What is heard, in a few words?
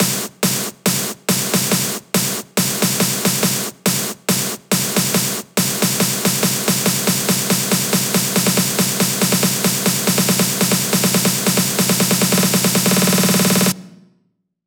140BPM SNARE 8 Bars; LuckyDancer; Vengeance Samples; Snares&FX Pairs